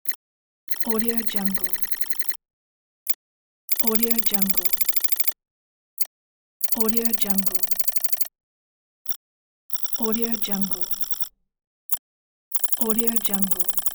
digital text pack; ufosounds

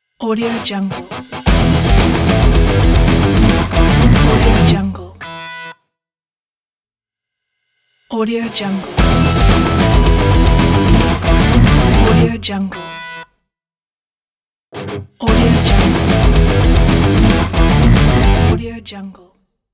rock intro logo; red-pepper-music

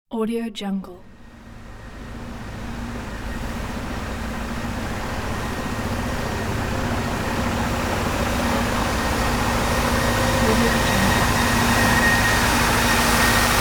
street sweeper machine passby; fxprosound

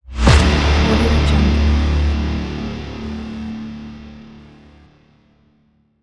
distortion guitar impact

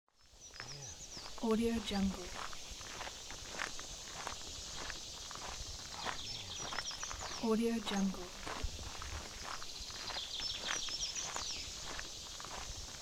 hiking and forest ambiance

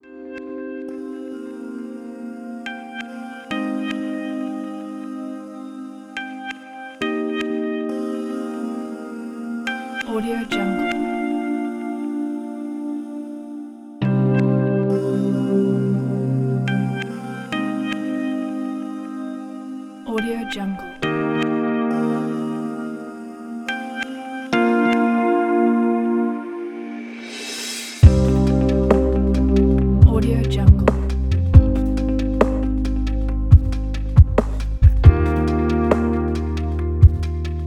indie; music-waves-production

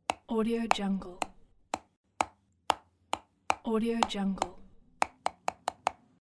teeth bite gnaw

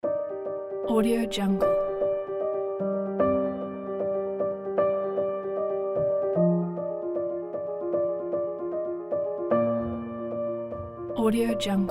exciting inspiration in motion

